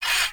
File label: Cargo Train Exit Bolt Slide; Warframe; Corpus; Railjack